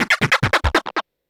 Industrial 140

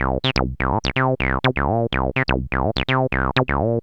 TB 125, TB-303 LOOPS

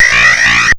BEEP; VOICES

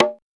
CONGA

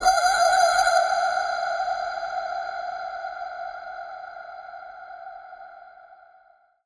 TECHNO FX 140

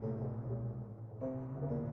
ethno, 125, A

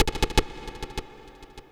TOM INDST 140, Industrial Drum

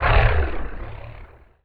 SPACE FX 140; SpaceSounds

